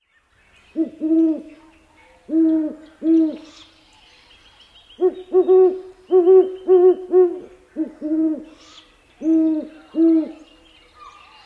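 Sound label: Great Horned Owl, hoots of pair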